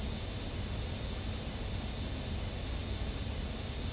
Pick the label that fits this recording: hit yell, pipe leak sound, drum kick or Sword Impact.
pipe leak sound